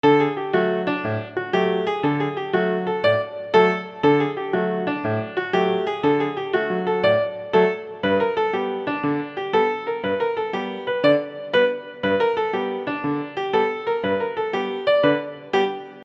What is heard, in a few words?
Amusement Park Piano Loop